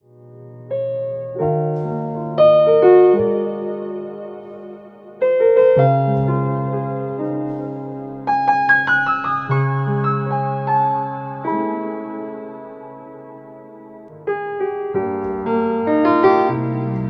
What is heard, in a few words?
Romantic Jazz Piano